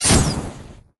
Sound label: attack